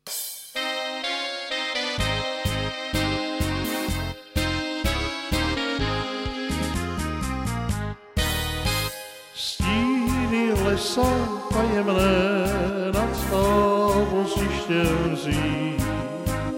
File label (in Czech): tango